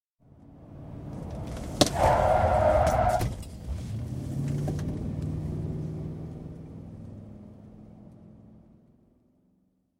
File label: Rolls Royce Silver Spirit, interior, emergency stop; 6.75 litres, 1986 model; Car; Car Sound Effects; BBC